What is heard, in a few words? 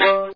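violin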